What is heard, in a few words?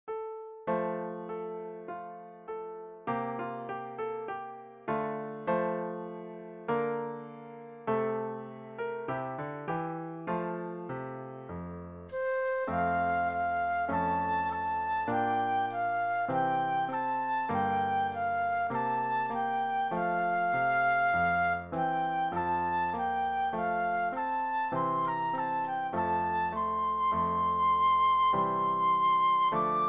Flute Solo; christian solos